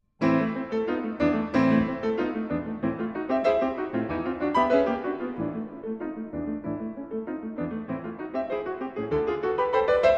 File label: Piano Sonata in D minor - Scherzo. Allegro marcato